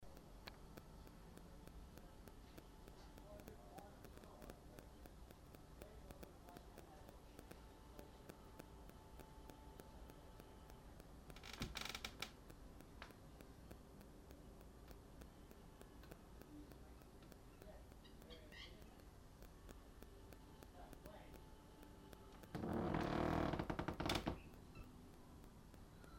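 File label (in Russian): в конце этого клипа скрипит стул который звучит как большой пердёж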